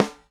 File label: Typewriter, Snares; Airwindows; BeyondSnares